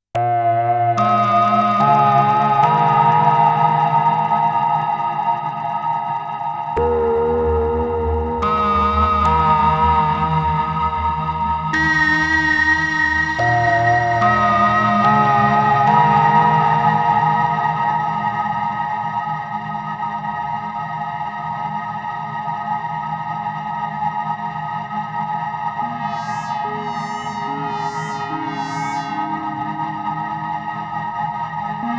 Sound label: Korg Albedo Ambient Performance